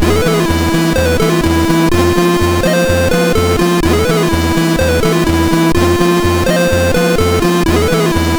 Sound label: sid MOS6581R4